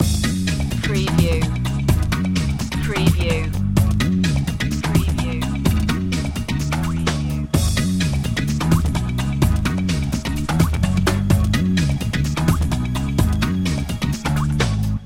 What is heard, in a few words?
funk loop